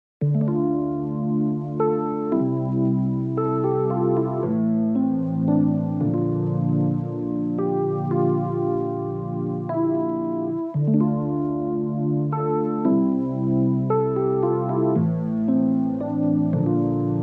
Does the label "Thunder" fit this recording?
No